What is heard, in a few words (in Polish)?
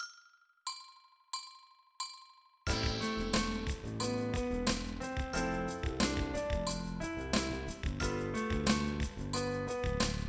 Z podkładem